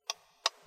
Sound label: click